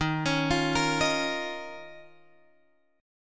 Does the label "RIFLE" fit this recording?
No